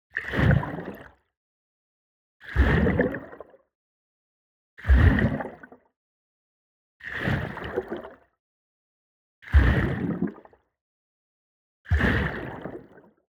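Water Movement Object Medium Impact Long Shallow Water Underwater Single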